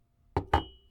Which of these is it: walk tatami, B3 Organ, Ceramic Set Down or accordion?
Ceramic Set Down